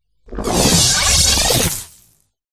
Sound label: jelly fish boss